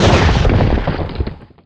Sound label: arise, statue